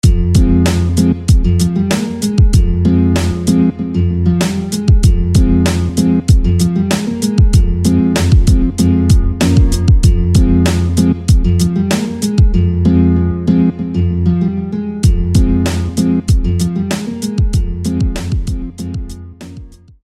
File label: Blue Night Guitar